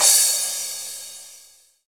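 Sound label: CYM CRA, crash cymbal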